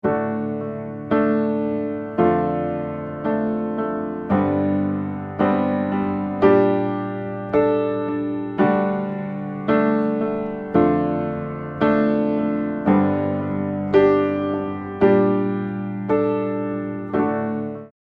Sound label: Piano Beat DRY